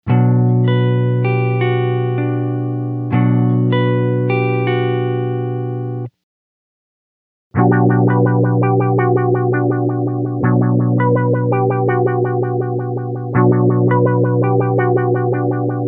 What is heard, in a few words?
filter; Mobius